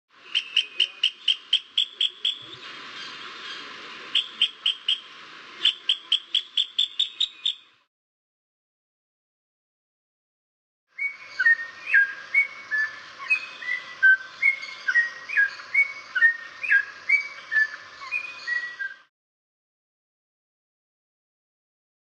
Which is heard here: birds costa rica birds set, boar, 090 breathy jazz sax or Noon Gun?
birds costa rica birds set